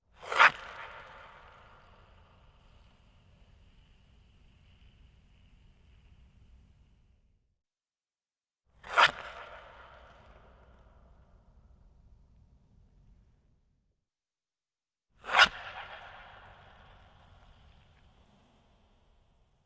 ice hockey stick shot pass wrist shot drag x 3 wide close; fvtt sonniss gdc audio